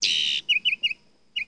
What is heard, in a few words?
mockbird; Jack; AMBIENTS